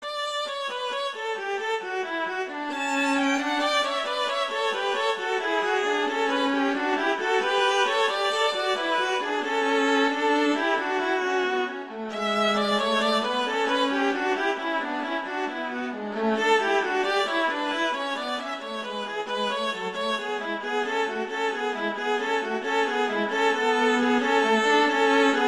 Gigue; Pachelbel; Canon; Vla

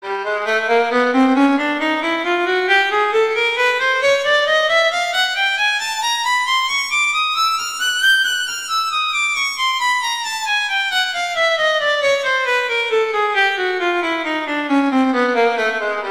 Chromatic Scale; F Ferrari; Scales

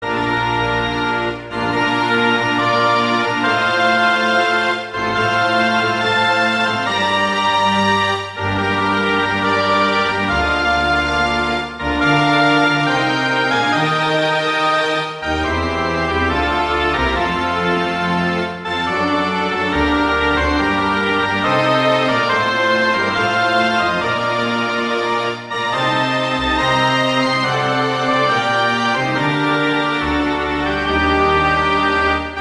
A tempo ordinario e staccato; Handel; Organ Concerto Op4 No2

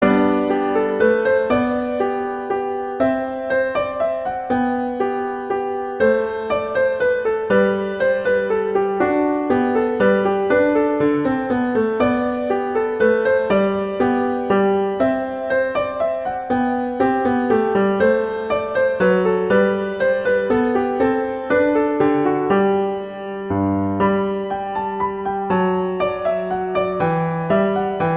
Minuet; Bach

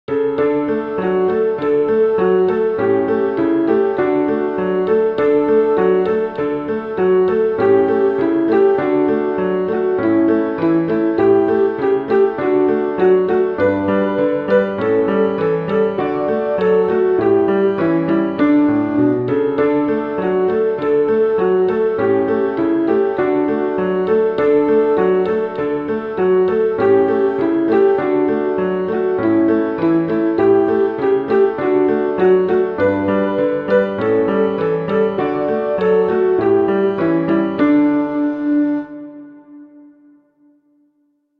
O Come Little Children; Christmas

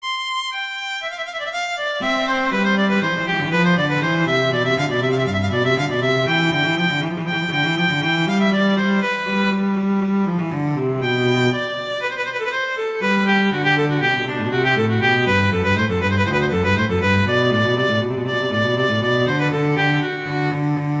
Allegro; Telemann; Canonic; Sonata 2

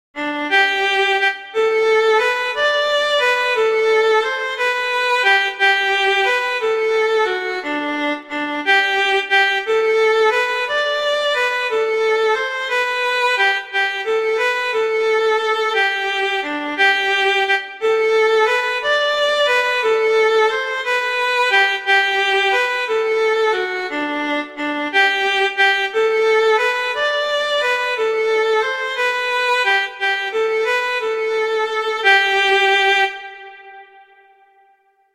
I Saw Three Ships; Christmas Beginners

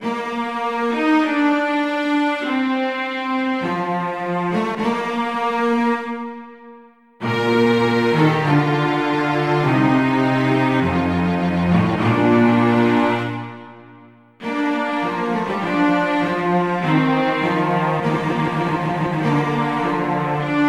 Largo; Telemann; Canonic; Vla Vc; Sonata 6

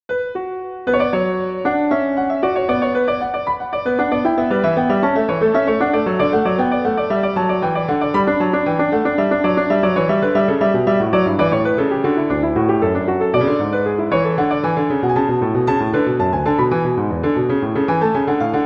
Gigue; Bach; French Suites; Suite III

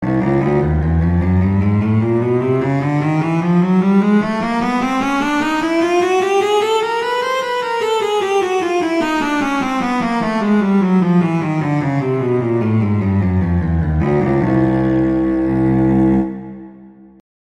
Chromatic Scale; F Ferrari; Scales

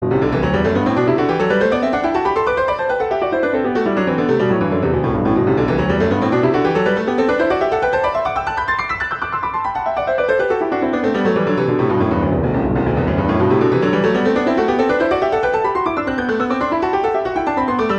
Exercise - G major; Clementi; Preludes

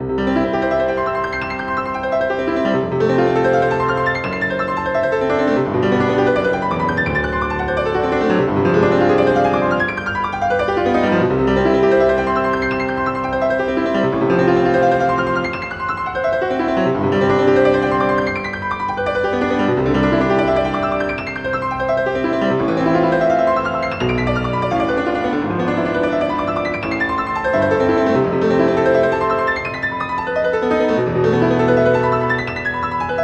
Etude, Allegro; Chopin; Etudes

